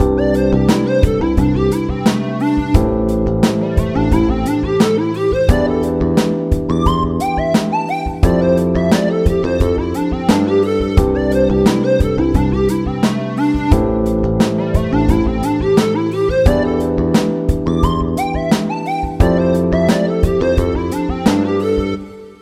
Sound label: ROCK SOLO, piano